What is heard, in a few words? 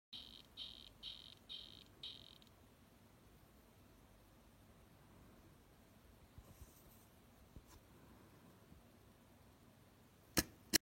ranitomeya benedicta calling